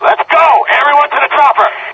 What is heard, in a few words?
chopper, soldier; Left 4 Dead 2